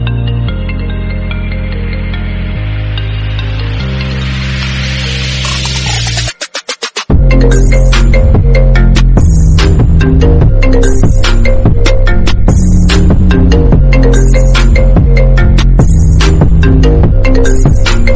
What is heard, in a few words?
apple iphone remix